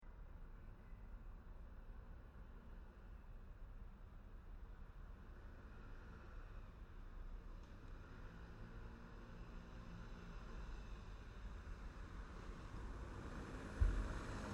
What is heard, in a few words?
Europe France Paris City Mutiple Car Motorbike Passbys Cobble Stone Road; Tom Hutchings